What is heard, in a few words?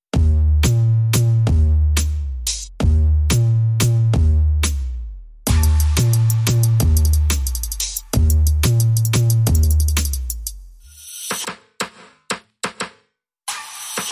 Drums